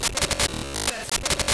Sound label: Glitched Audio, Electronic; SFX